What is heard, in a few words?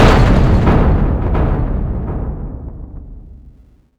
foot midfar